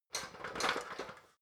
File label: ladder metal rattling